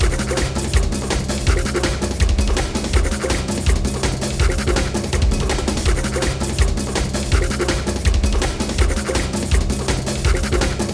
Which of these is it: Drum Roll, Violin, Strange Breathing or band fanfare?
Drum Roll